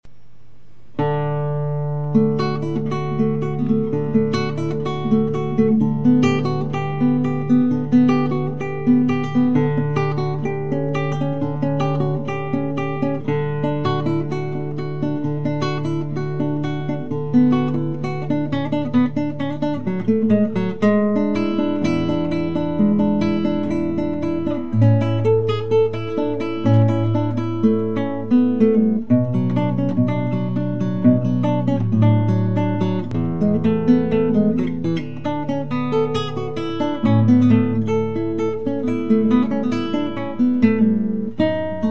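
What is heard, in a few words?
bach prelude cello; Classical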